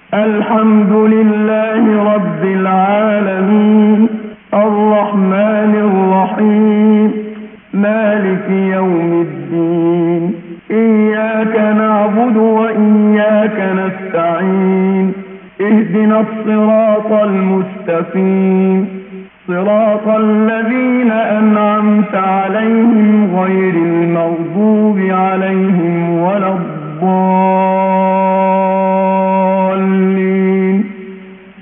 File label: muezzin